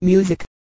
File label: music